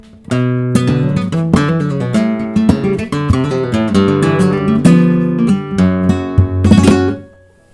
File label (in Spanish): falseta